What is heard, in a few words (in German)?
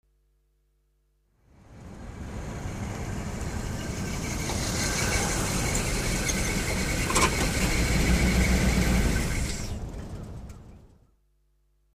Autoankunft, Verkehr